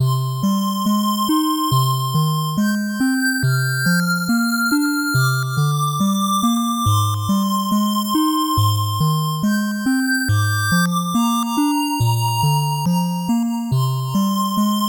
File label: 8bit bgm slow